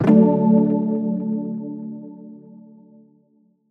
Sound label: Quest complete; Drox Operative 2